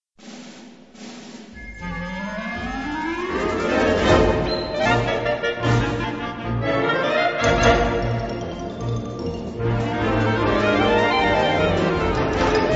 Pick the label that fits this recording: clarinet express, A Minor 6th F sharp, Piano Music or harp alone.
clarinet express